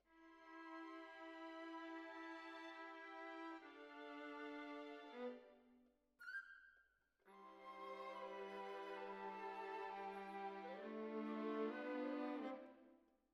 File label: Violas Over